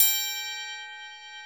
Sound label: ETHNIC PERC; Roland - LA Composer vol. 1